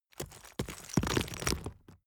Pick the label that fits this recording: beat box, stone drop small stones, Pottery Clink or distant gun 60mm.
stone drop small stones